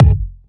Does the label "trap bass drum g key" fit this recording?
Yes